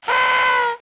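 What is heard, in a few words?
party horn; Microsoft Graphics Studio Greetings; Microsoft 1998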